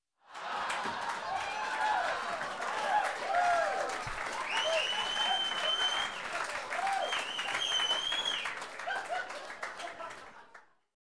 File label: Audience Laughing Cheering and Whistling